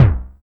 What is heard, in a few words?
NOISE TOM P; TEK PERC2